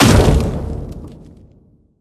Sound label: Viking ship Strand